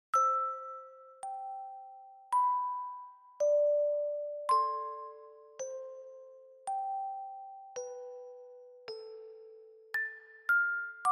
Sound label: Reverb Slow; Air On The G String